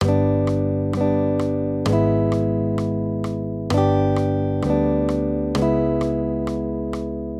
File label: maj triads; accompaniment